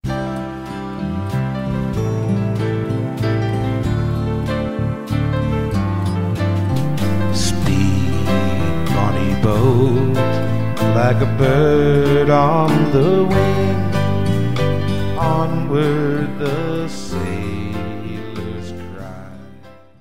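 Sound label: round dance Instrumental Waltz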